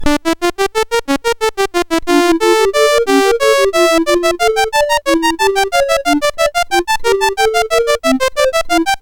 Baroque Music 64 BASIC, sid CSG8580R5

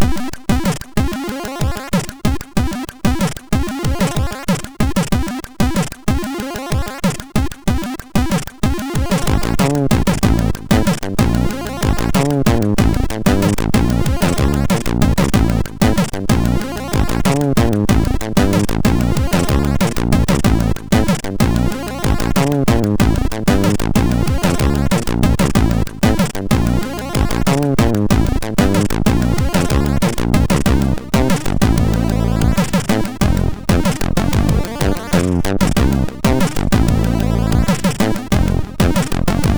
Slow Funk, MOS6581R2

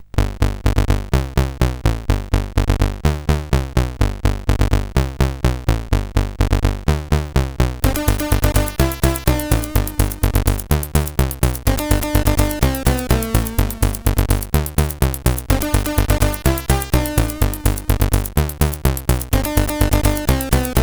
Rainy Days, sid CSG8580R5

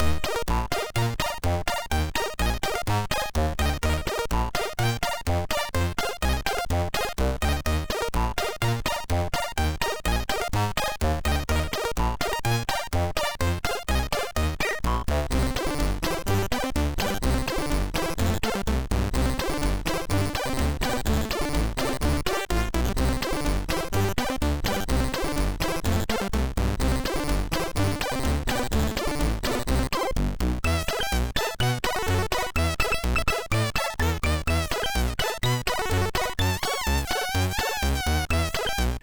Guldkornekspressen Lvls, MOS6581R2

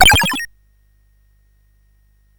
Paddle Mania, CSG8580R5

